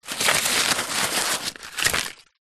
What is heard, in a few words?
foley paper pieces small pick up then drop